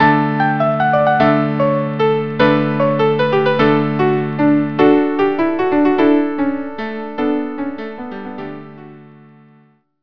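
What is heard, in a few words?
violin, viola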